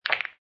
Billiard Pool Snooker Ball Hits Several Other Balls; Pool Sound Effects; Pool ball, snooker ball, billiards ball: Ball hits several other balls